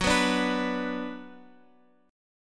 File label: Gb Suspended 4th Sharp 5th Gb, down, Guitar, Open F; strummed; JGuitar